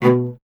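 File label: strings cello B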